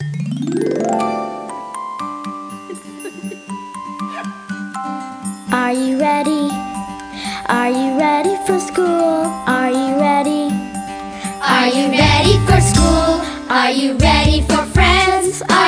Intro Song; Fisher Price Ready for School Kindergarten

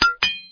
Item Crafted; CastleMiner Z; Xbox 360

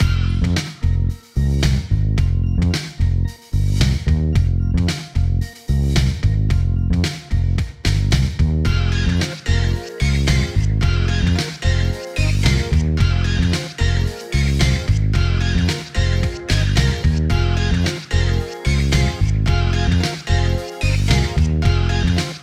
Pause Menu; Friday Night Funkin' YTP Invasion V3

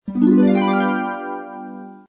Task Done; Blockman Go; Android

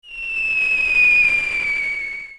Weapon Artillery - Going Medium

